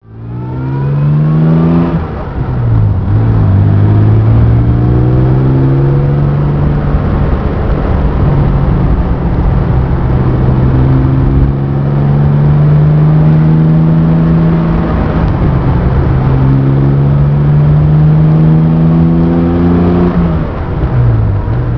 xk150 drive

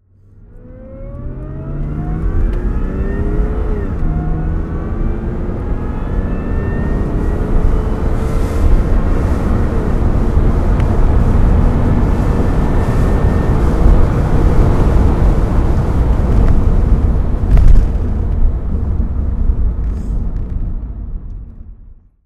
aston martin db7 volante driving

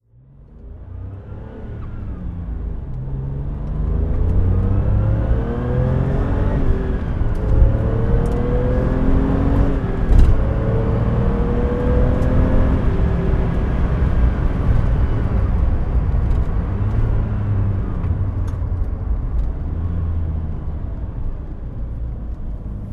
porsche 911 993 turbo driving

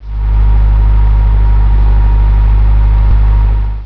190sl idle, classic car

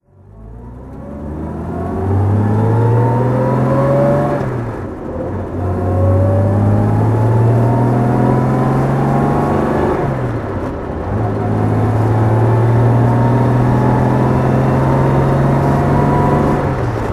jaguar etype 4.2 fhc driving

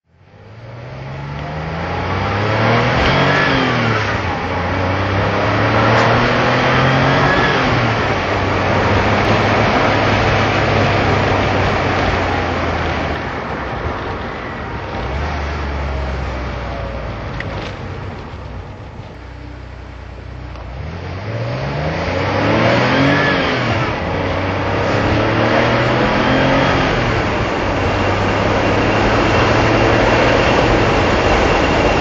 porsche carrera 3.2 drive

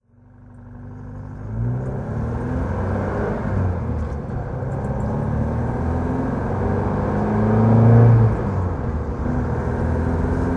alfa giulia 1300 driving

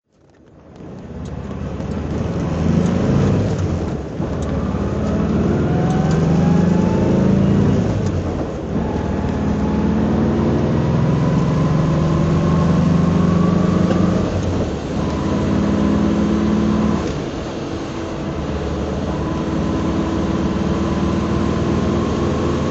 porsche 356 pre A 1500S drive

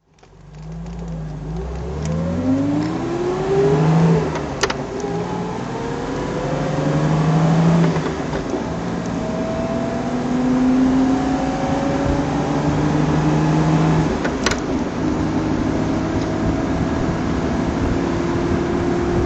mercedes 300s roadster drive

